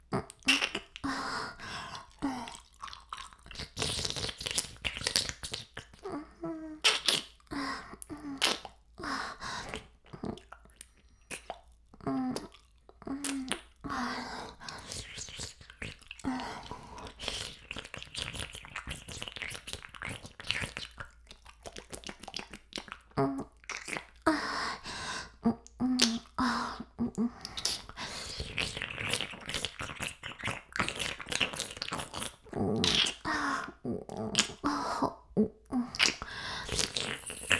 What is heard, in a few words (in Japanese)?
耳舐め音声; 白くて黒い魔性円環